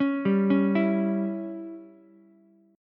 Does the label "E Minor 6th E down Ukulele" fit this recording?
Yes